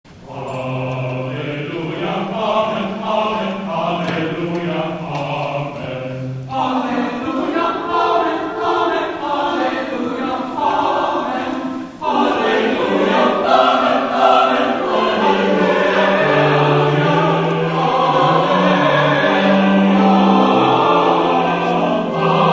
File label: Hallelujah Amen